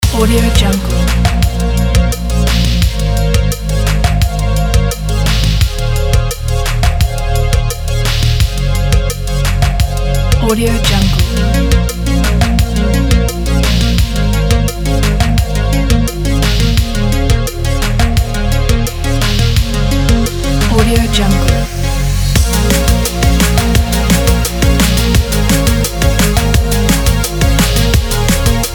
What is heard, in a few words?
retrowave synthwave dnb background loop; boxbeats